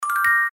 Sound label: solo sparkle